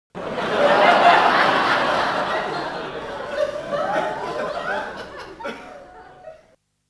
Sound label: big crowd laughing